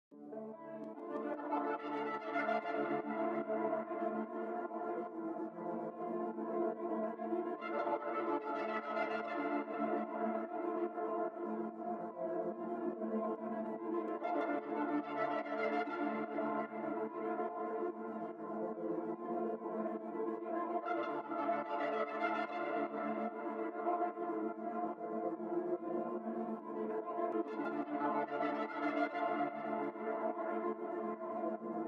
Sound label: 145 BPM D Min Texture; Melodies; Loop Stems; Cymatics; 2022 Melody Mystery Expansion; G Class